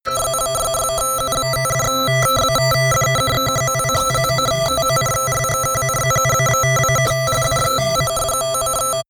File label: digital error